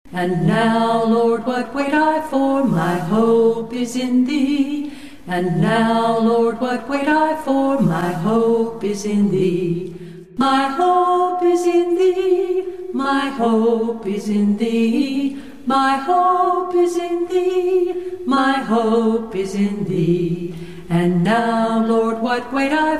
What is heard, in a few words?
a capella scripture song